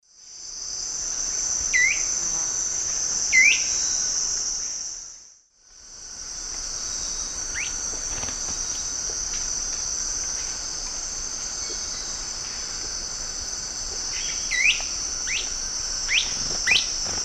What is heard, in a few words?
Megastictus margaritatus, contact calls male; S. America; Eastern Lowlands